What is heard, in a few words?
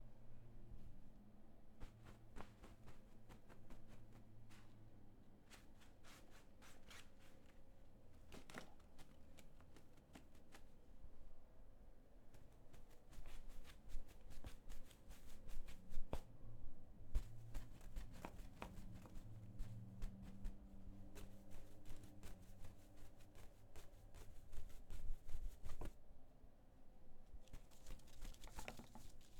Dusting